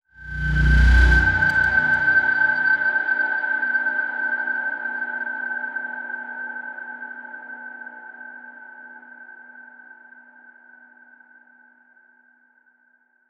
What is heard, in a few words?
Game Over Stinger; Curved Space; Switch gamerip